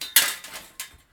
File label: gling; jocly; mana